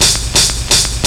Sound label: HH LOOP; USB Soundscan vol.27